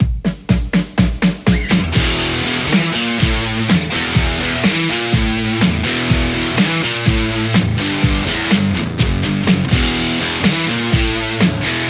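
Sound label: Stratocaster; igniteamps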